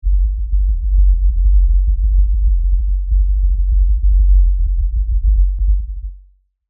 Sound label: Tremor Long LFE, Scifi, Tremor, Long, Lfe, Rumble, Science, Fiction; BlastWave